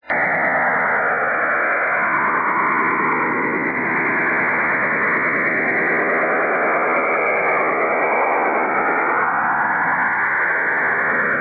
Stanag 3288 kHz USB; FTDX5000; Schwarzsee

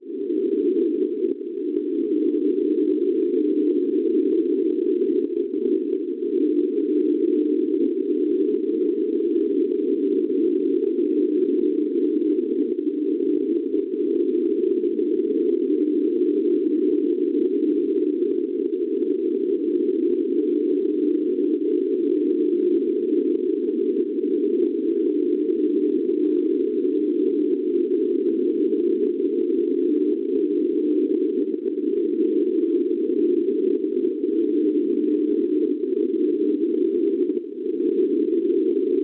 NDB, 324 kHz; ON; Norrkoping; Svezia; Schwarzsee

